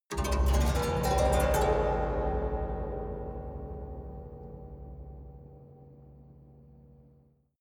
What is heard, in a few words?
haunted strings up stroke fast soft